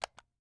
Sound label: Multimedia Internet CD-Rom Flash Digital Joystick Button Pushing